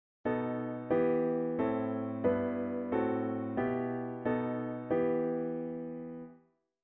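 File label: diatonic inverted V7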